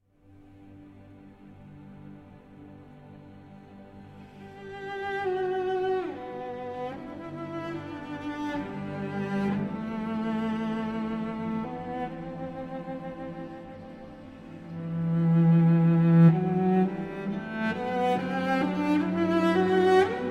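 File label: cello, harp and ensemble; Le Carnaval des Animaux, R.125- 13. The Swan (Arr. Hodge For Cello, Harp & Ensemble)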